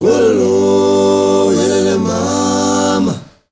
MALE GRP, M. CHOIRS, Heart of Africa; Spectrasonics; Heart of Africa 2

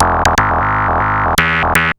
Analoop, Industrial Analog

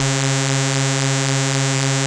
BASS C4, Jungle; Explosive Jungle; USB Soundscan vol.05; AKAI

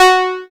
SYN, Microwave; Sound & Vision - Gigapack I CD 2

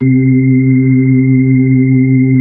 B-3, Brite; Keyboards of The 60's and 70's

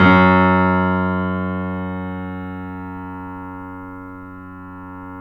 F# -L, The Piano; InVision Interactive - The Piano

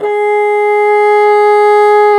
Bassoons

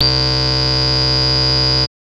Anasound Long C, Industrial Analog Single Notes; Sonic Foundry (Sony Creative Software)

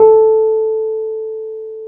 PNO, Wurly

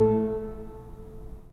3D Pipe Mix Celeste, 3D Organs; E-MU Producer Series Vol. 5; 3-D Audio Collection

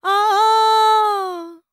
World Voices, INDIA; ILIO; Vocal Planet

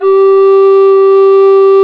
ALT G 2, MOECK; SoundBytes - Early Patches

